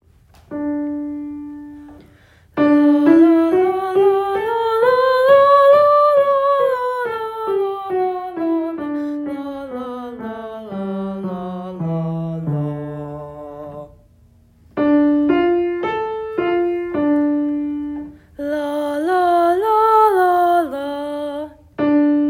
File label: Alto; Audition